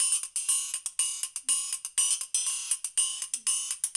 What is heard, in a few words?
120, Coil Conga; Spectrasonics - Supreme Beats - World Dance